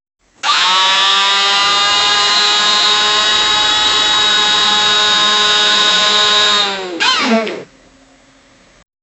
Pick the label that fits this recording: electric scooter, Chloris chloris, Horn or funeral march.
electric scooter